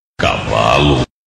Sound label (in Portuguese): caavalo